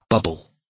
bubble

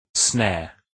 snare

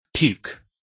puke

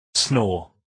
snore

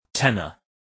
tenor

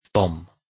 bomb

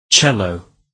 cello

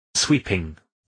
sweeping

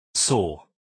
saw

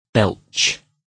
belch

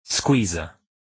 squeezer

